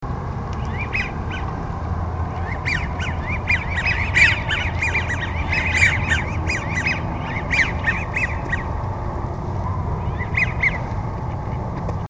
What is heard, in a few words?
American Wigeon